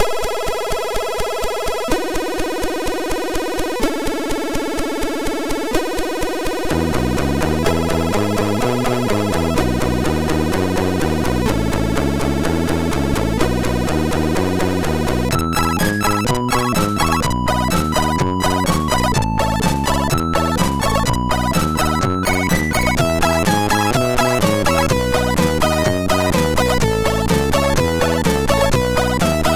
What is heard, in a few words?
Happy sid MOS6581R4